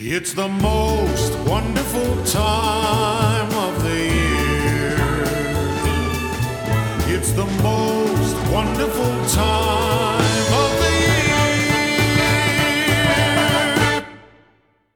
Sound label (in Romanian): Craciun Rington; Kaufland; Craciun 2020